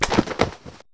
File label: Book